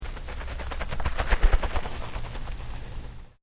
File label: Sport Soccer Roll Past Grass, Soccer ball sounds